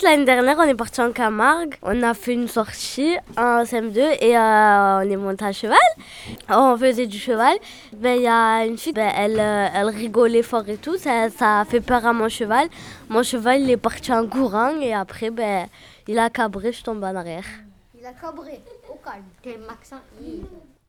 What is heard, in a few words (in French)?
rigoler la fille; cabrer en camargues